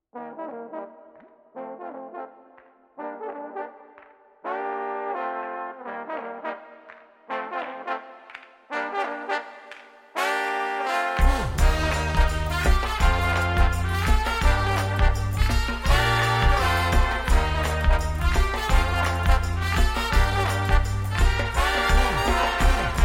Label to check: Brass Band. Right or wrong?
Right